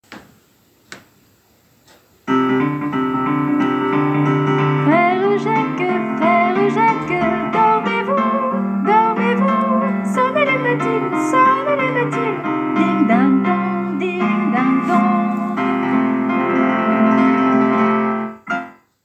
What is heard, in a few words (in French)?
frere Jacques en jazz swing; 2 avril journée internationale de l'autisme